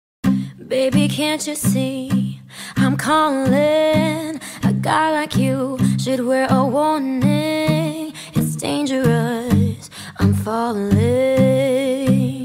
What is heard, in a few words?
JAZZ